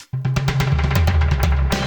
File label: Tom Fill Old School 128bpm, Session Drums; Drop3 Dubbed; Ableton; Factory Packs; Session Drums Studio